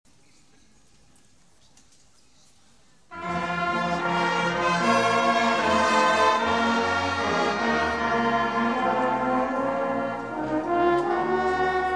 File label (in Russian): Оркестр; Мерефа